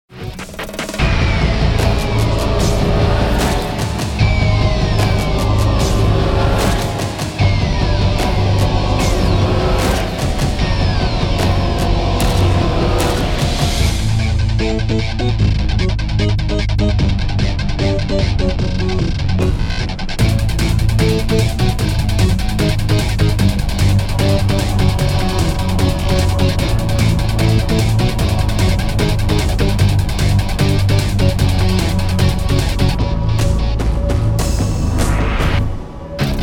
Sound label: Action; Mothergunship